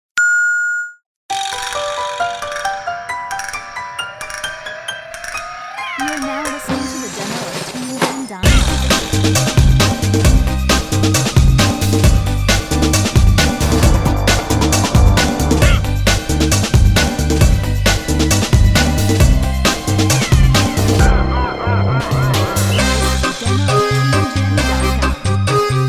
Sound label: Tango